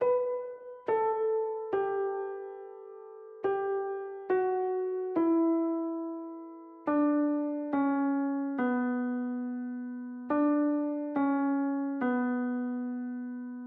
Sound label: 140 BPM B Min Piano, Trap; Melodies; Arcadia